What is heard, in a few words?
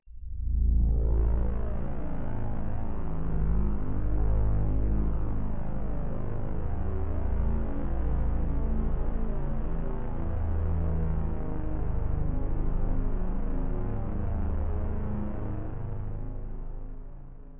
Deep Bass; CastleVania 64